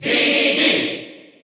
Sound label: Cheer Dutch; King Dedede; SSBU